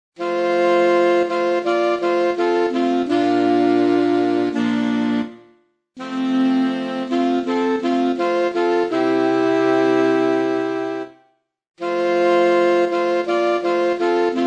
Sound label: sax